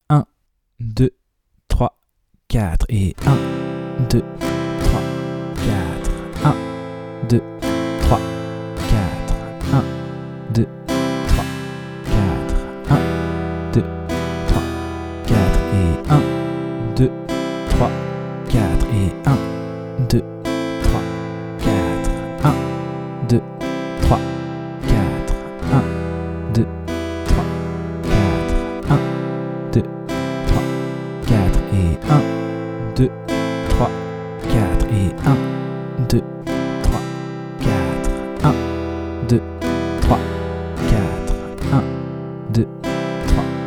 moyen travailler la nouvelle grille avec la deuxième rythmique; De 0 à vos premières suites d'accords

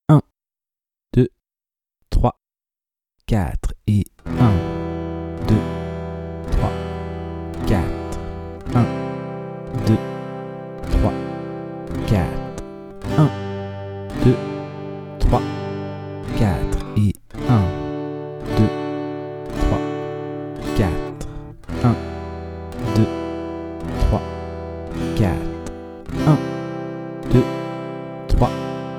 lent travailler la nouvelle grille avec la première rythmique; De 0 à vos premières suites d'accords

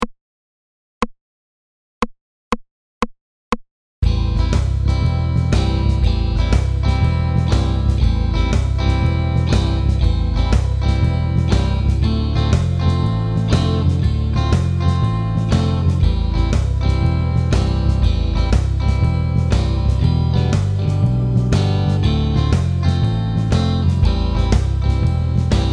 rythmique 2 120

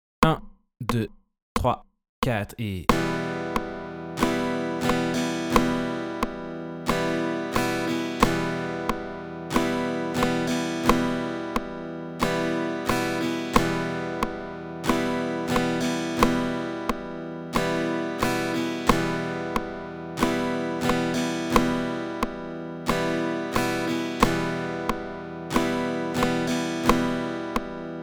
Rythmique classique 90

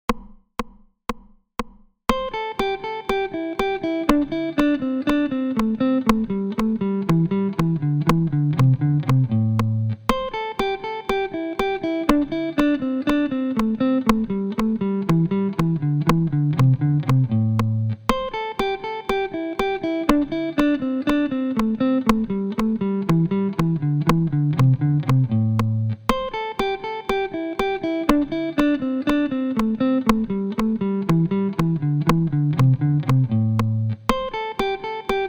Vers le grave

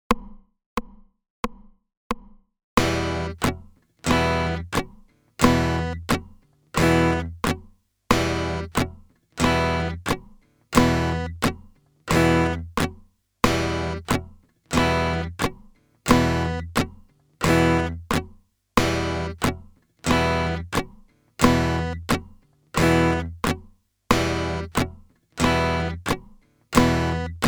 Ghost notes sur 2 et 4 90

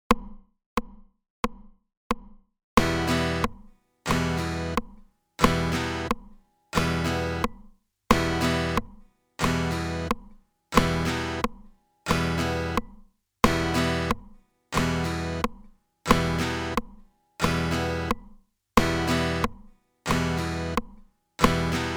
Couper le son

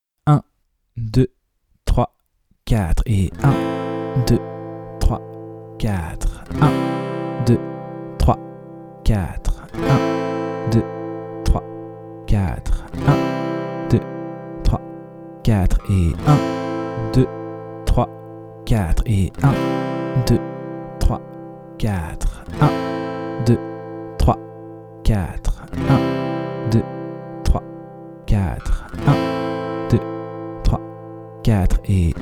2 moyen Enchainement de Am à C

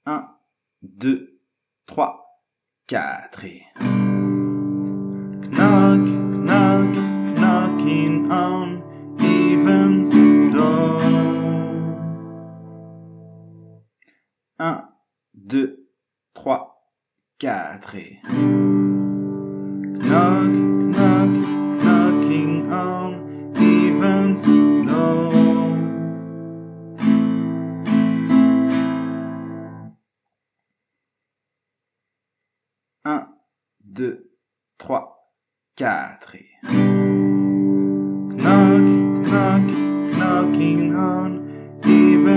Refrain chanté Moyen

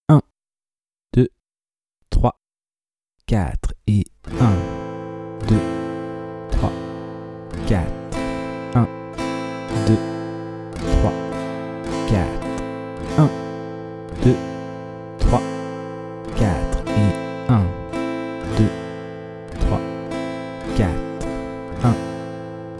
très lent Travailler la rythmique rapide; S’entraîner à jouer tous les temps en débit rapide. Bien respecter tous les mouvements, même ceux pas joués.

